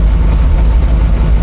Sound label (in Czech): auto; Mafie 1